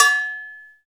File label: CowBells; Club-50 - Foundations Roland; CowBel+Metl